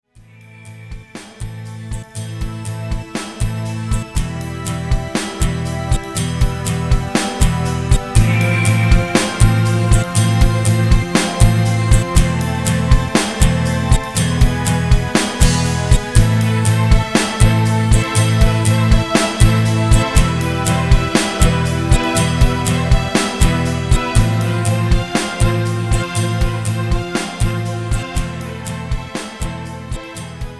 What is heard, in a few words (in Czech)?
zkracena verze instrumental